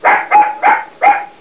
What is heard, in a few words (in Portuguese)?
cãozinho